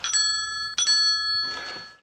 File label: bell rings ding